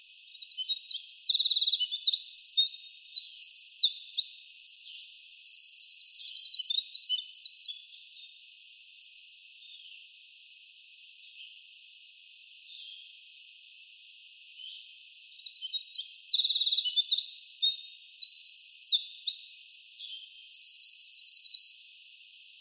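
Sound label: wren voice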